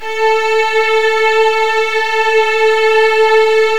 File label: A2, Virtuoso Strings